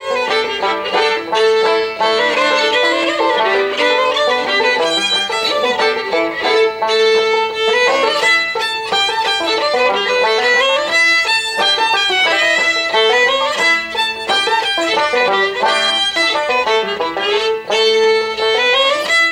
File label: British Field March, musicbox